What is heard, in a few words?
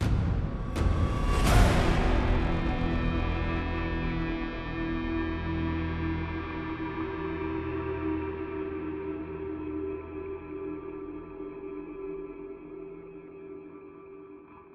Loss; apex legends season 12; Gibraltar